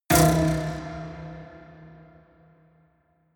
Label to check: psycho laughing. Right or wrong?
Wrong